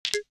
Connect; Nokia N79; Audio themes

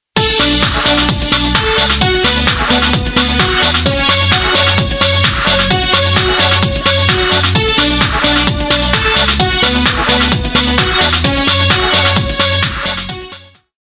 Ring Dance; HP iPAQ Glisten

